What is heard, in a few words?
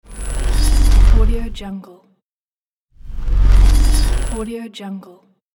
hologram appearing disappearing